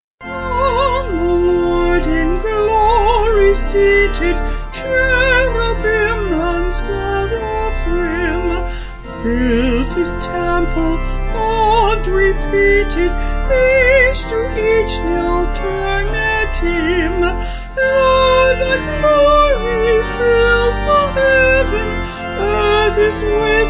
Vocal Organ

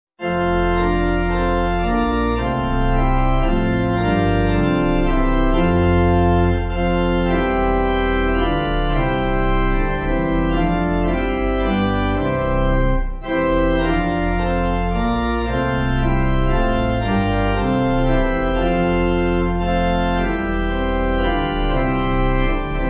Pipe

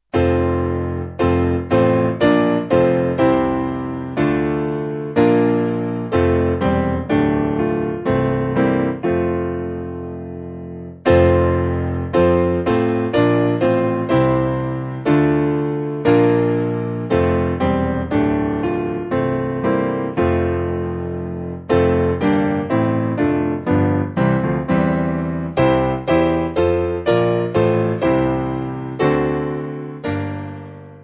Piano; Es Ist Ein Ros

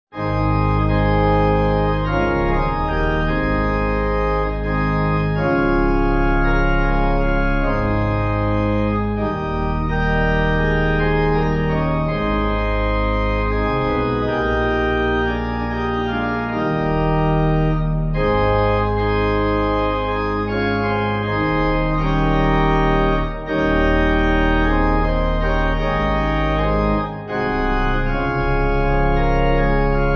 Pipe

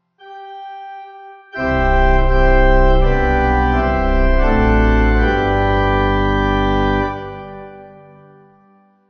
Morning Service, The Salutation, Pipe

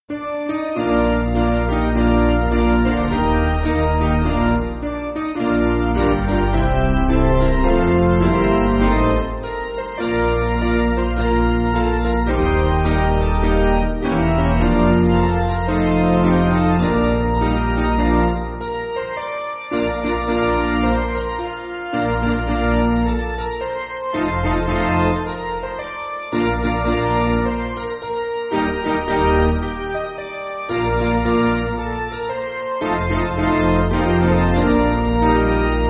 Piano, Free Music Backing Files for Churches

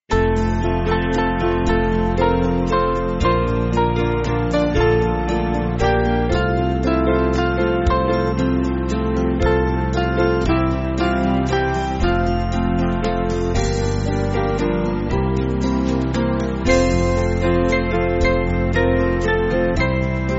Band

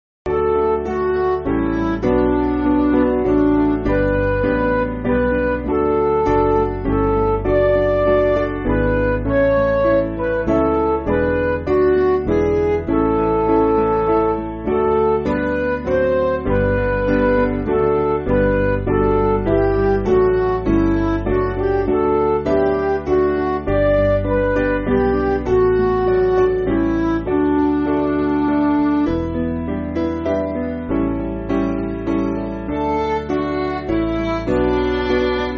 Midi

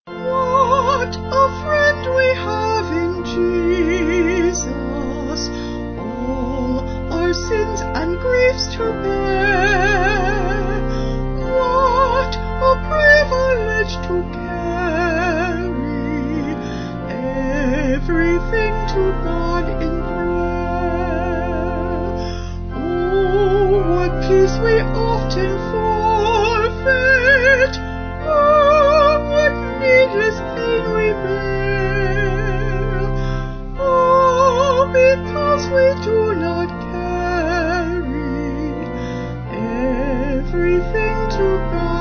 Vocals Organ

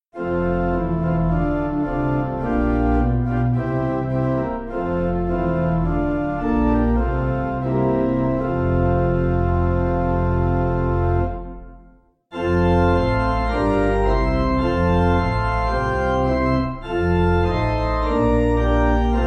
O Bless Our Family, Vesper Hymn, Pipe Organ

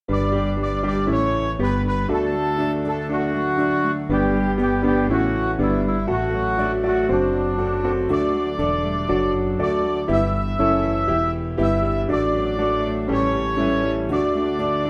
Music Backing Files for Churches

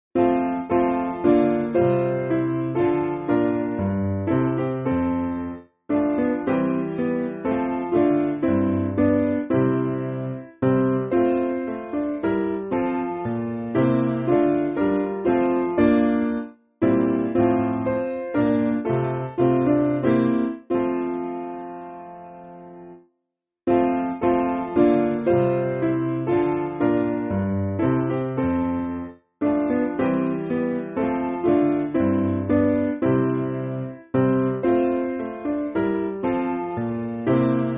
Piano, Music Backing Files for Churches

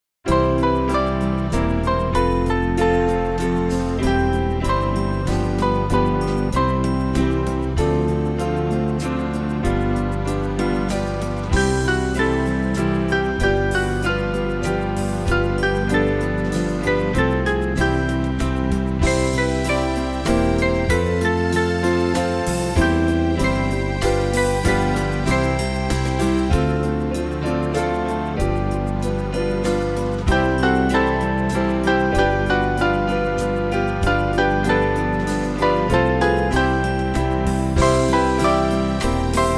Do Not Yield Thy Way, Band; Small Band

